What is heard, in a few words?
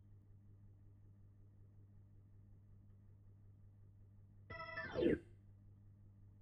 Hammond